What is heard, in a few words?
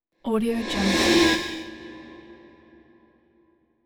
scary high metal reverse